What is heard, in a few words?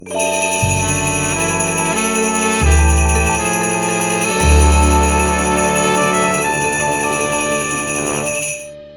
Win; The Grinch Christmas Adventure; pc-9821 ps4 ps5 switch xbox series xs